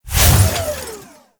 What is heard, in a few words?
Mech Melee Swing; Nechro Mech; Vehicles